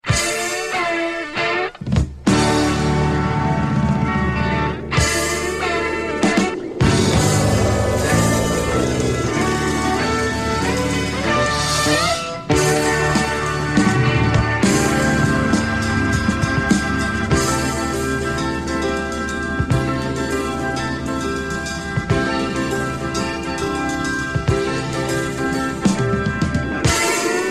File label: loop